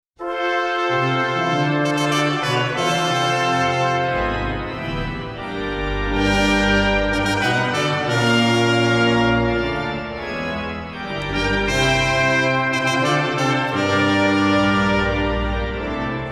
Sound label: Fanfare; Selah Recordings